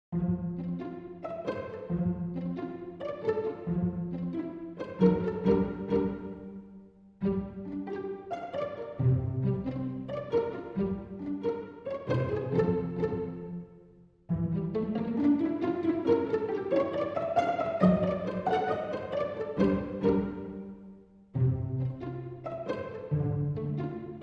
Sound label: Strings Only